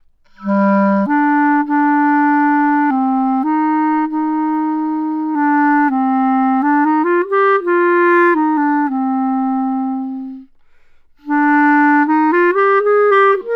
CLARINET FET

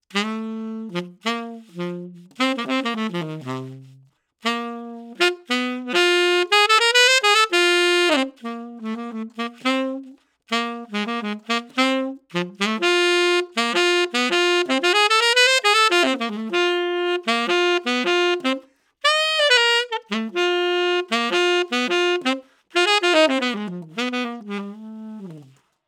SAX TUBE

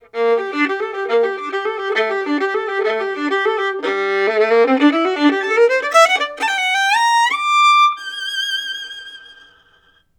VIOLIN TUBE STEREO

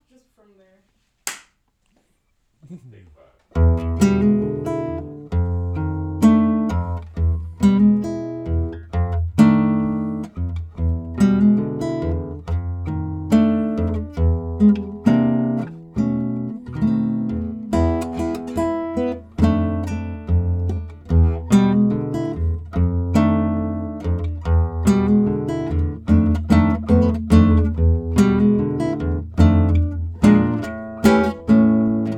nylon string guitar

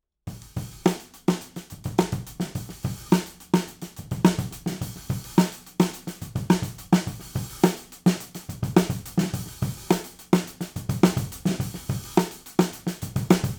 OVERHEADS; TUBE

